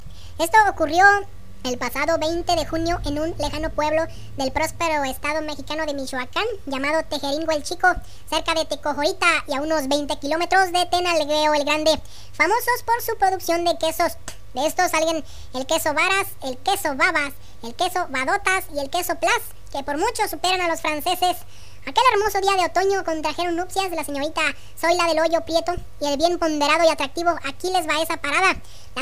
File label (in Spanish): Boda Mexicana